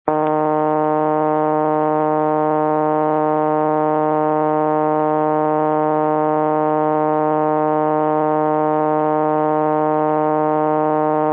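755A Dialtone, Sounds from a Western Electric 755A step by step PBX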